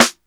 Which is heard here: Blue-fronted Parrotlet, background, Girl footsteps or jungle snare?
jungle snare